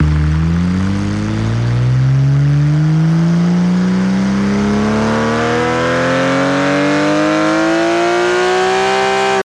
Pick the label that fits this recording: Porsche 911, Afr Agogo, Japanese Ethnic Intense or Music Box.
Porsche 911